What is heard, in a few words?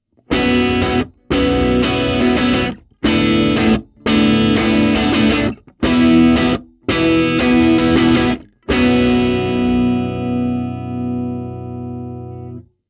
Guitar Box - C12 BiDirect - Edge modelled